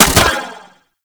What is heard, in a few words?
Space Drone Bomb Fire, weapons; Grn Space Drone